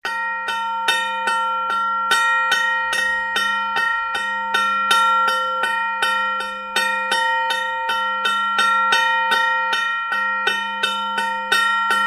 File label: Railroad Crossing Bell; Slower Steady Bell Rings, Varying In Speed And Pitch. Close-up.